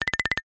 cartoon flutter eyelids shake fast

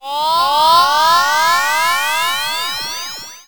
sound design pulsating shrill ascend

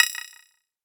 multimedia rollover; sound effects one

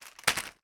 packaging plastic drop; sound effects one

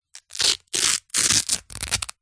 surfboard leg rope leash velcro rip

